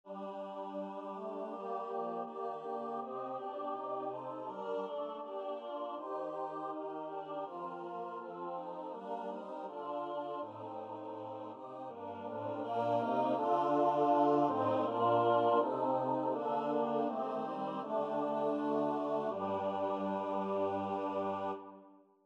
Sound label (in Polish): midi psalm; gomolka; boze litosciwy psalm 64